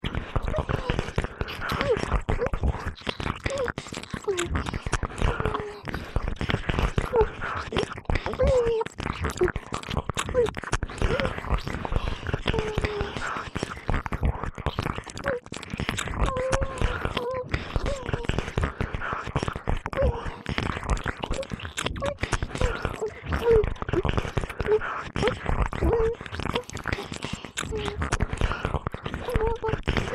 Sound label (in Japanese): 【ディープキス】＋【高速れろれろ両耳舐め】 通常耳舐め